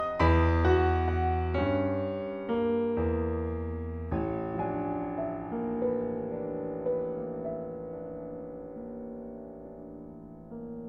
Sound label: Ballad